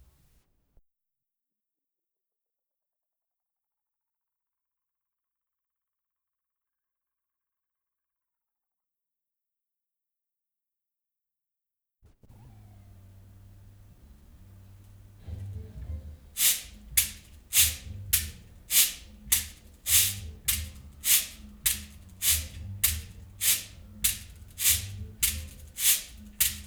moog

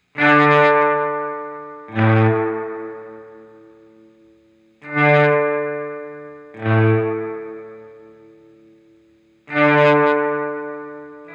guitar volume swell

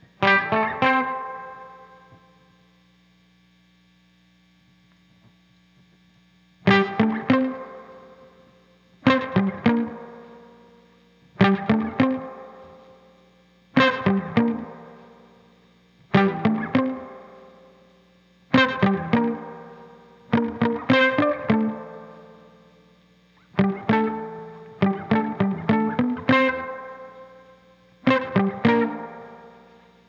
guitar rhythm

